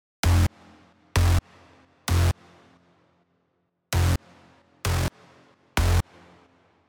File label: eqt bass